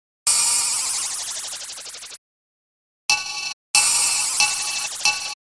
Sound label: dubstep; dubstep fresh